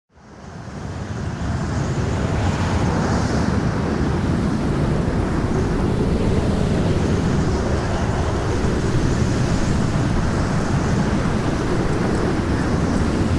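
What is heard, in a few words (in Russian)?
хлесткий сильный ветер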